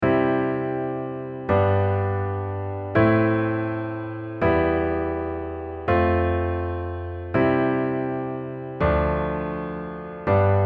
white note c, accompaniment pattern

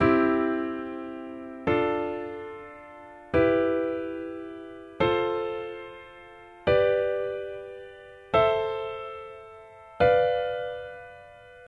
diatonic triad

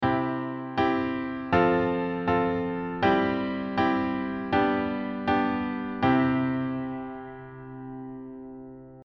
inversion, accompaniment inversion

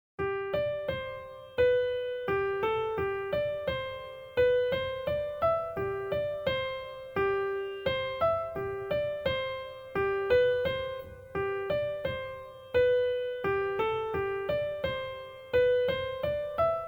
Intro melody

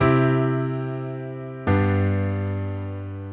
connect, accompaniment both hands

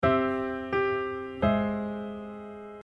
diatonic

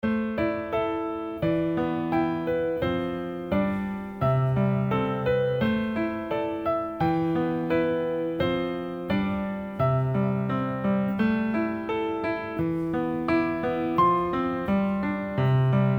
LH arange

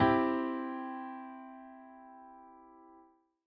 inv C root, accompaniment inversion

